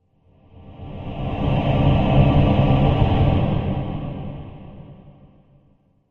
Music Eerie Male Cho, choirs

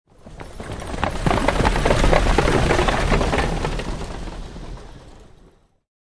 Carriage By Fast

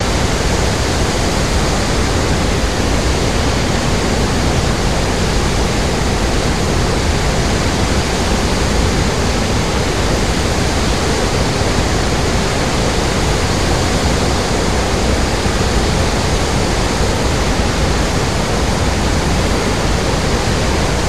Waterfall Low Roar

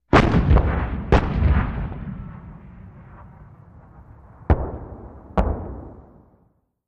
105mm Howitzer: Fires, Close-up. Dual

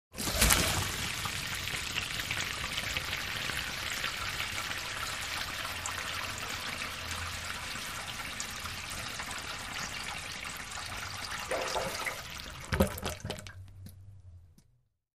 Wash Basin Trap Under, Pipe

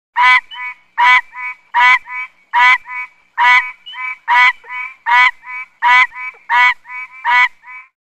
Frog Tree Honks Serie

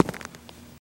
FS Male On Hardwood, singles wood

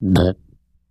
Cute Burp; burping

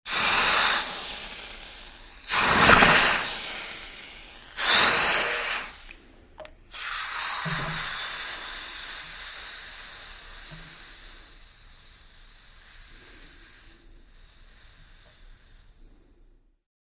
Iron Cool Water Stead, steam

